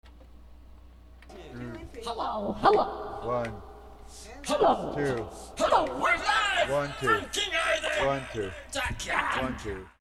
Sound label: Acapella